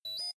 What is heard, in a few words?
bot default, robot